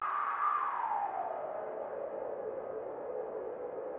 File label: Processed Noise; layering